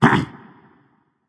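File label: nonverbal clear throat; smite; voicepack; merlin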